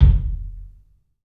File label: KIK, LIVE CLUB KK, Drums; 3D Sound